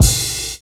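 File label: cymbal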